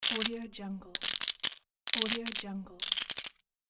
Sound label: poker chips